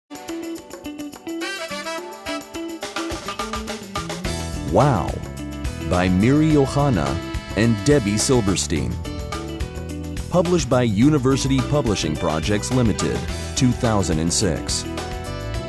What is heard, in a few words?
Sound Tracks